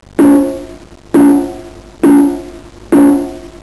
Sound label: open tone, congas; technique